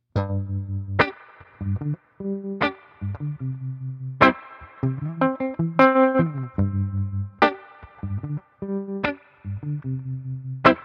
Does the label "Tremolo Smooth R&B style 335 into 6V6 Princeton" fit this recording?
Yes